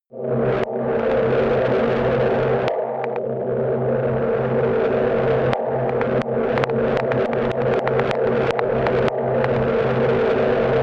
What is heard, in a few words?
atmosphere geiger glitch, Horror; atmosphaeren umwelt